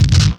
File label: DROPFIRE, Impacts, Hits & Booms; Distored Reality